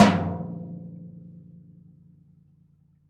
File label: Lo Rack Tom Hard; StereoRoomKit